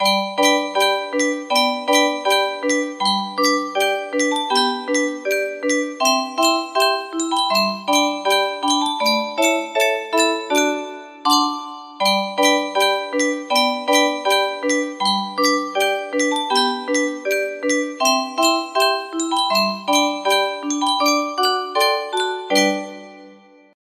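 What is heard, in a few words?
jingle bells short, music box melody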